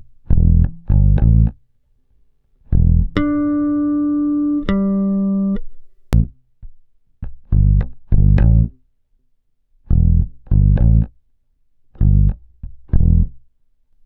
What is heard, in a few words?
COOL RK 100, Bass Grooves; USB Soundscan vol.30; AKAI